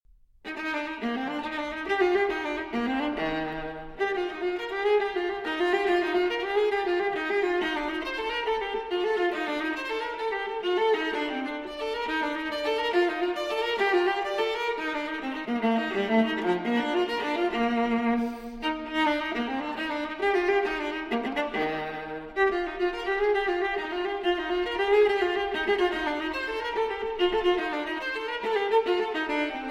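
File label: Cello Suite No. 4 in E-Flat Major Transcription For Viola Gigue; J.S. Bach; BWV 1010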